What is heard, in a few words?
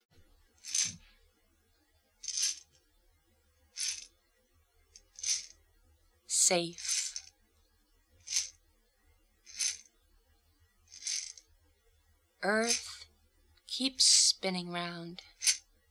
flute haiku; safe